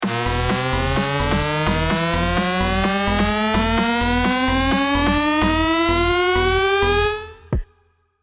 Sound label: riser